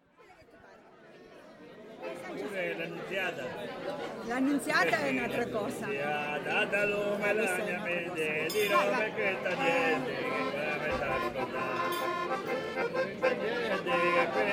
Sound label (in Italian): canto dell Annunziata